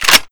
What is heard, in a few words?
grenadelauncher cylinder; weapons; glauncher